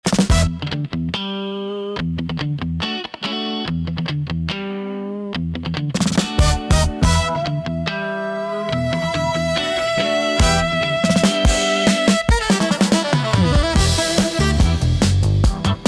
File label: funky train